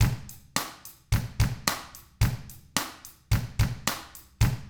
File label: POP, Drums; ILIO; Double Platinum Drums 1